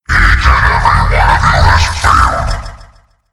dreadnought summon

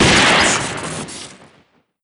shoot crit low; the steel battalion

